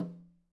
Nosnare; Digital Sound Factory Protean Drums

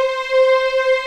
C5 LEG, String; East West; The Ultimate String Collection

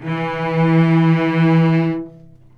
VCS ATK E3 L, VCS ARCO, String Collection

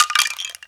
PERC, PERCS KIT

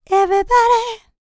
LICKS, Vocal R&B Dance; LT DANCE

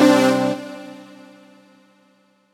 Dance Mega Synth; Best Service; 90 s Sample CDs

